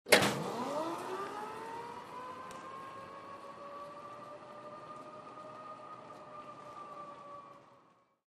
Golf Cart Electric Go, Electric Golf Cart Click Into Gear